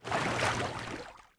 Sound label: water wade